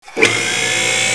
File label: spin up